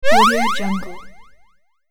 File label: anime power